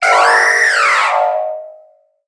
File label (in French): Cri Méga